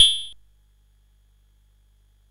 Dark Castle, CSG8580R5

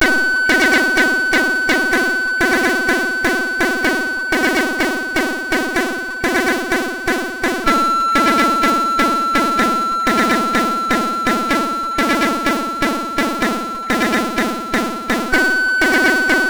sid MOS6581R4; Express Zak

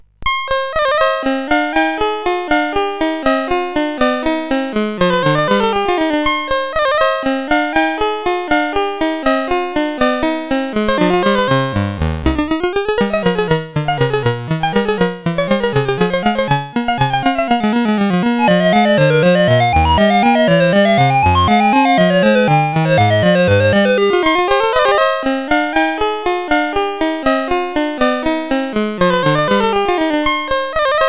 Partita 2 Rondeau sid CSG8580R5; hvsc

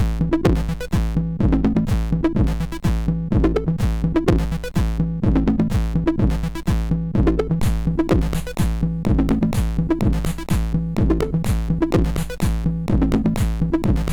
Short Acid Loop, sid MOS6581R4

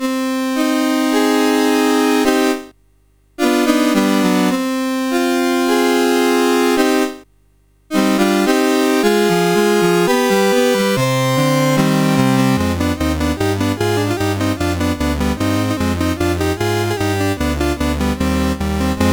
Minor Trio, CSG8580R5

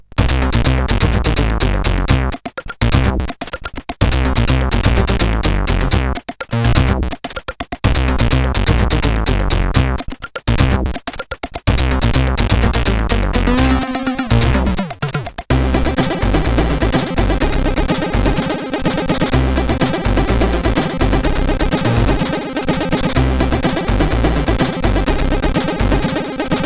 Game Level 2, CSG8580R5

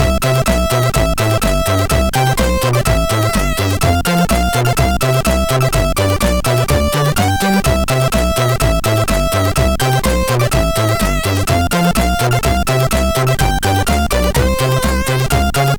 X-Mas Song, sid MOS6581R4; hvsc

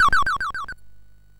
Shuffle, MOS6581R2

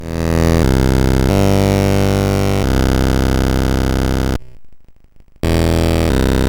MOS6581R4, GAMES

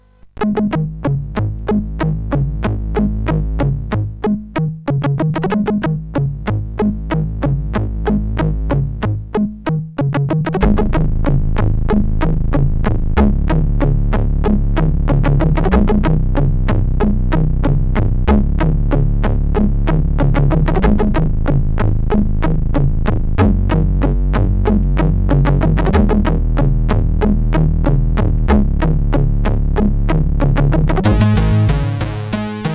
sid, CSG8580; hvsc

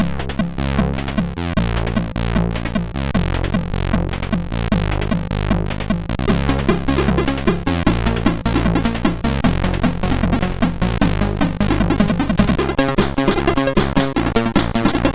Intro, sid MOS6581R3; Sabre; Paradox

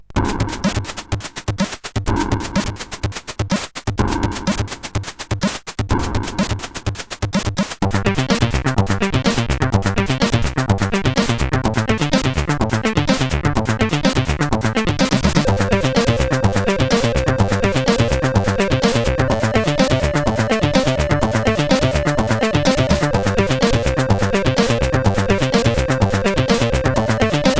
House, sid, CSG8580R5

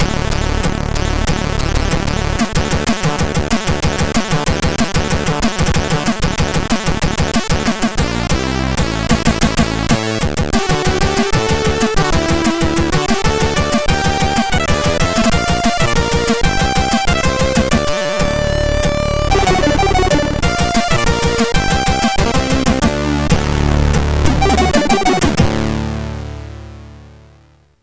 Opening sid MOS6581R3; Street Fighter II; hvsc

